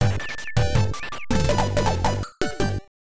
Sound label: Battle Won; dragon half; pc 9801